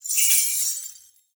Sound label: Naginata Whoosh Tonal, Weapons Melee; Warframe; Koumei Naginata